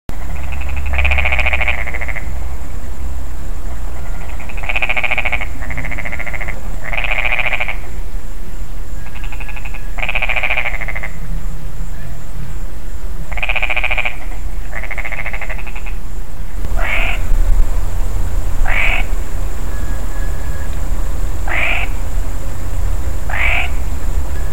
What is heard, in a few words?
Rana cancrivora